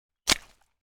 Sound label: Single footstep wet muddy puddle